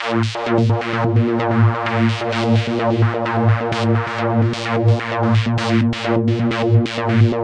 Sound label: arp mover, synth and electronic loops